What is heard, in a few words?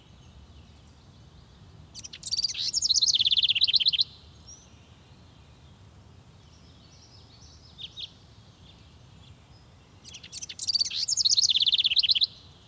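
bird forest; RYL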